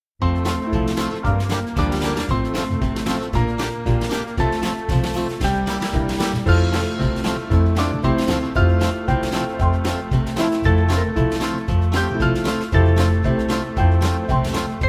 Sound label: Spiritual, Band; Amen